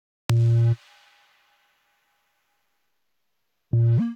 Bass C Minor 140 bpm; MIDI Clips; Ableton; Factory Packs; Skitter and Step; Off Track

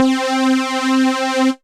Midnight Chords, Synth Pad

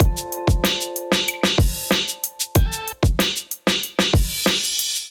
Kingsway Kit, Drums; Ableton; Factory Packs; Chop and Swing

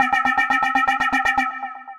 Arp Pluck, Synth Rhythmic; Toasty; Ableton; Glitch and Wash

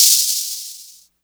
LoFi long rattle; Loafa; Ableton Foundation FreePacks; Wicked Kits

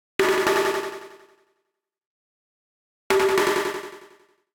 Downer Strikes 165 bpm; MIDI Clips; Ableton; Factory Packs; Skitter and Step

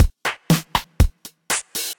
Kit-DMX, Effected Drum Kits; Kit-DMX Tightdope; Ableton; Factory Packs

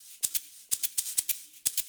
126 Dance Shaker; Dance DJ; Percussion; Shakers

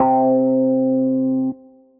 Suitcase Funk, Suitcase Piano; Suitcase Boulevard Funk; Ableton; Factory Packs; Electric Keyboards

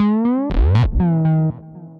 keyoff bassline, synth rhythmic; Ableton; Factory Packs; Synth Essentials

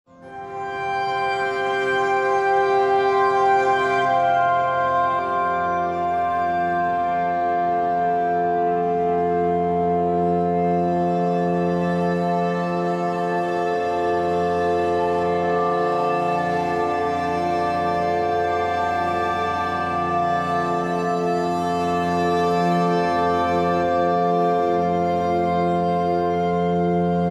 Rustic Cello Bows - FMaj 100 bpm; MIDI Clips; Tonal; Atmospheric; Ableton; Factory Packs; Mood Reel

